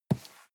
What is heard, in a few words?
cardboard box medium grab slide hard; objekte